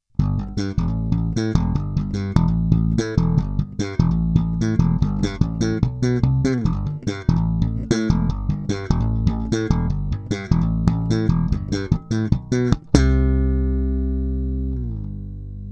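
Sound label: Copper, bass; La bella; Rotosound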